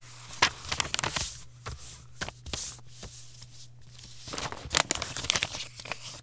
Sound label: Letter Folding